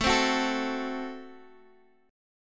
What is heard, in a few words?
F sharp Diminished A